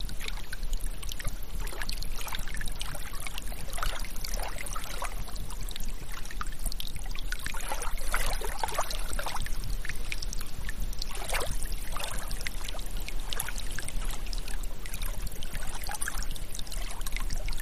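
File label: Water Lap Light Movement, streams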